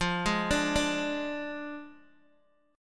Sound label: F Minor 6th F down Guitar DADGAD